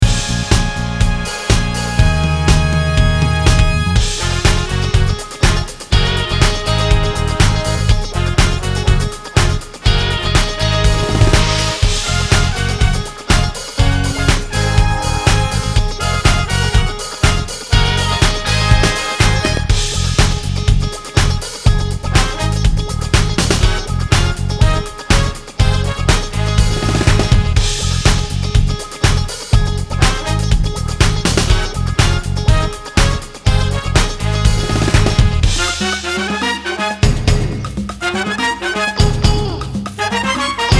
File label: Ab o Piano o Backings